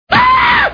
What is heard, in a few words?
aaaahhhh; homer